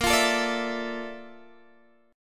Bb Major Sharp 11th Bb down Guitar Standard, chord; strummed; JGuitar